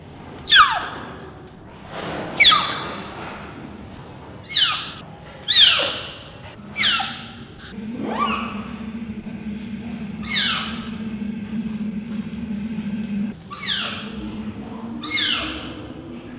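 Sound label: cheetah yobi ai, チーター 呼び合いの声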